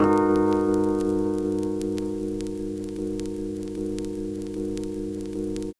CHD, Jungle; E-Lab; XTC Files of Jungle

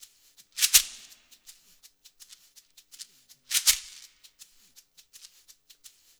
LOOP, SHAKERS; InVision Interactive; Percussion Slam

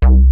FRONT ATTACK, MINIMOOG

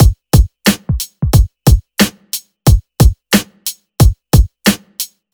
BEAT, Hip-Hop & R&B

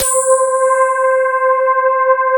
CMI PIANO; East West; Twisted Textures-BT

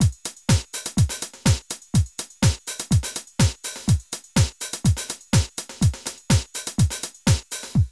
drum loops, House & Garage

